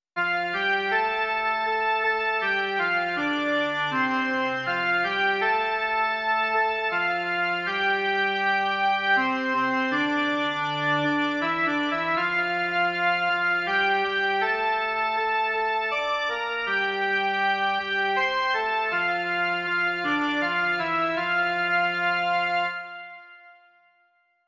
jen melodie

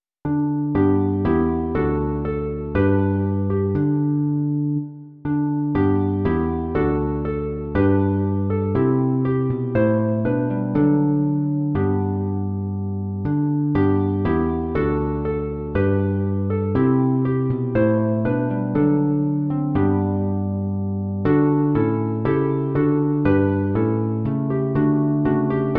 harfa a baskytara; Buď Bohu všechna chvála, čest; Imaginativní verze

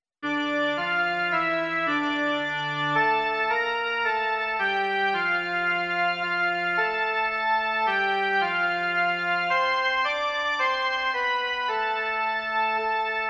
jen melodie; Tobě, ó Pane Ježíši

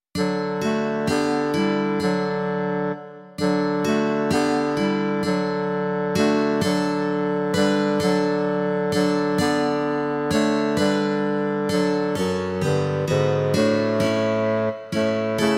kytara, klarinet a fagot; Když zřím ten divuplný kříž; Imaginativní verze

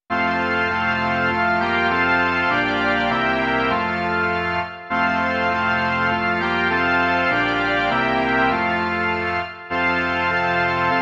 varhany

